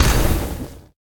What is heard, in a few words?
pet destroy; smite; vulcan